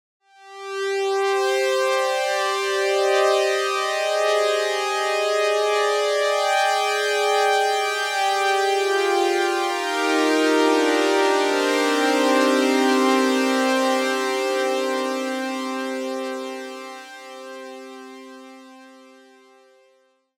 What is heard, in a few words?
strings